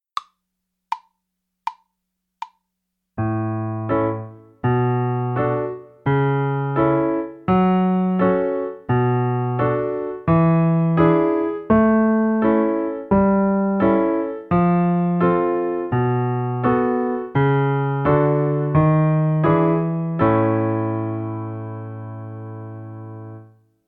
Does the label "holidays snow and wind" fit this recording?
No